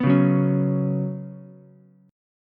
F sharp Suspended 4th, Ukulele; strummed; F#sus4

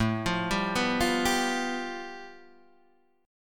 A 7th Suspended 2nd Sharp 5th, Guitar, Open D; 7,8,5,5,8,5

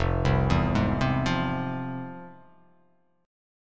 F Minor Major 7th, F; 1,3,2,1,0,0,x,x

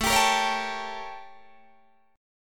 A 7th Flat 9th Guitar Open D

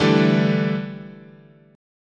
D Suspended 2nd Suspended 4th, chord

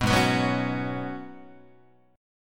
G sharp Minor 11th G sharp Guitar, chord; G#m11 strummed; 2,4,2,4,5,5; JGuitar

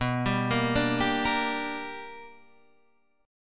B-Minor Major 7th Sharp 5th B down Guitar Open D

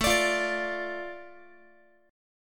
A Suspended 4th Sharp 5th, Guitar; Asus4#5; strummed; x,17,17,15,18,x

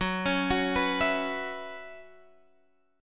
F sharp 7th Suspended 4th, Guitar, Standard; x,9,11,11,12,12; arpeggiated; capo=3

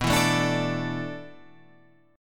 D 6th Suspended 2nd B, chord; D6sus2/B strummed

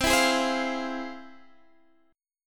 F Minor Major 7th, chord

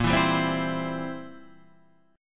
C Major A sharp; strummed; chord; JGuitar

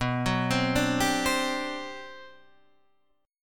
G Major 11th B

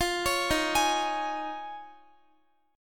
Eb 7th Suspended 2nd Suspended 4th Eb, Guitar; x,x,17,18,15,16; 4F,4A,5C,5G,5C,6E; arpeggiated

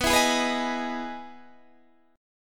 E Major 7th B, chord

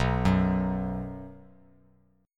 B 5th B, chord; arpeggiated; JGuitar

